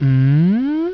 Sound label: eat good; billyboy